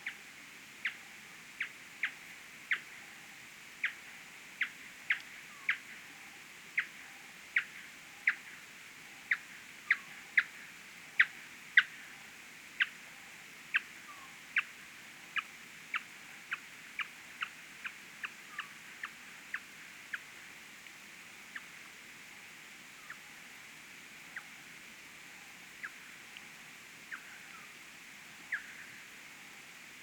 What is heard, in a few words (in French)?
T teniotis FM miaule; Ariege; M-Barataud; Acoustic Ecology of European Chiroptera